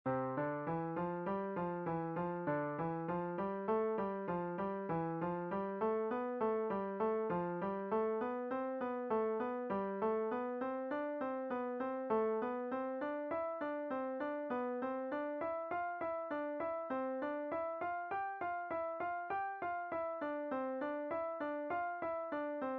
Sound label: Five finger step, piano